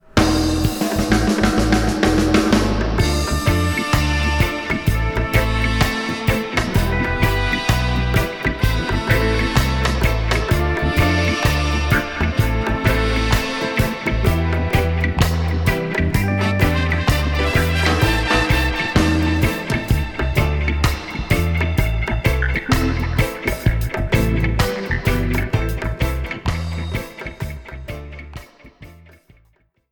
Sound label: Rock This Dub